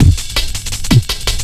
Drum Bass, Drumloops, 165bpm

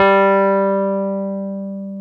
PNO Wurly; Keys of the 60s and 70s

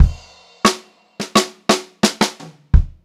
87 STR FILL, Funk-Groove Drumloops; USB Soundscan vol.38; AKAI

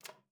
KLPB B4, ALTOSAX; Xsample VOL-2

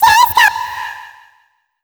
DISCO, Funky Vocals, SHOUTS; USB Soundscan vol.18

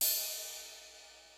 JAZZ KIT; Kid Nepro; Elite

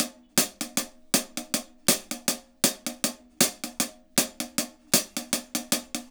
HAT, HI HAT LOOPS, Dub; Drumdrops In Dub VOL-1

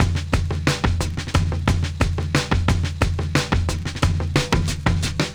Electronic, Drums & Percussion

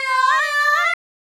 Fem Vocal 128bpm G FX, African Voices

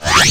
Whooooop; Digital Sound Factory Xtreme Lead

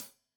Hat Tight, Hi-Hat; Deeply Sampled Drums

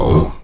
AHOO E 2; Spectrasonics

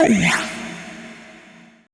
FX VOCAL

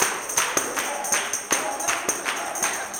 160 TAMB BRK; Blues & Soul

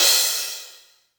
Cymbals, Elektron MachineDrum; SPS1 MKII

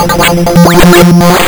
lsdj waves; wavetables

